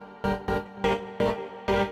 SYNTH INDST 125 A, Industrial Keys

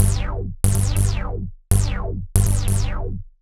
ELECTRO BASS 140 A

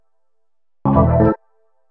HOUSE EXTRA, 125

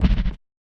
Perc, drums; One Shots; Percs; Touch Loops; BlissedOutBeats

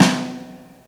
JAZZ SD, JAZZ-DRUMSET

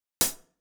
Hats, One Shots; Touch Loops; CosmicFunk

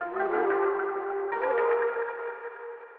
ethno, 160, C

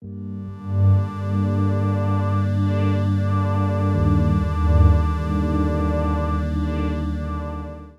120 Cm midnight 90s; Loops; Touch Loops; BlissedOutBeats

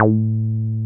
TB 303 BASS

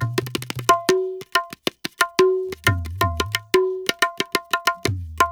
ETHNO EXTRA 90; ethno2

